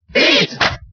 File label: Cheer Italian; Pit; SSBB